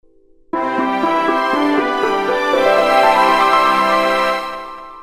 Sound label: Jingle